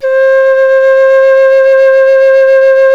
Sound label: KENA C2; The Andes